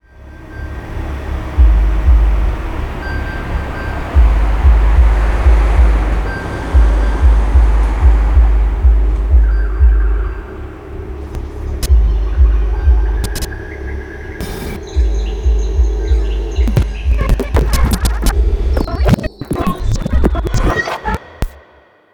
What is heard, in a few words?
diaphanous acarina proche loin; illustrations musicales